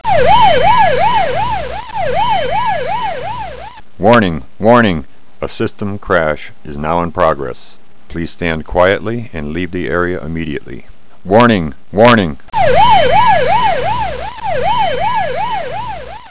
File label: warning system crash